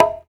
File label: Congas, African Contemporary; Spectrasonics; Supreme Beats